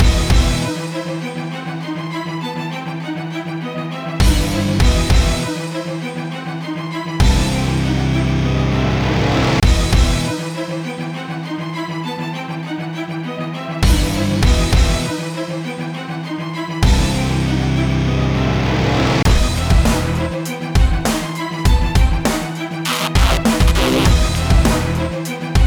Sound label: Orchestral Hybrid; Real Steel Champions; Android